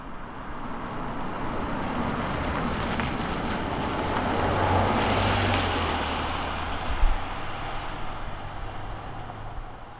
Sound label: Car On Wet Road